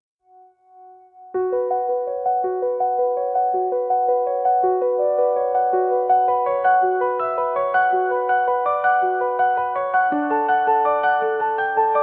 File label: synth prog